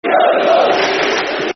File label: Clap, Football Chant; Yeovil; Yeovil Town Ytfc; fanchants